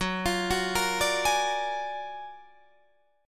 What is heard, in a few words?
F sharp Augmented Major 9th F sharp Guitar Open D